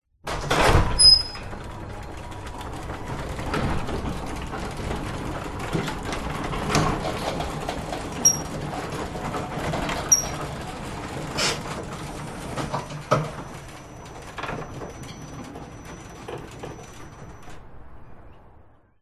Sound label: household garage door electric wooden open rattle whir; zapsplat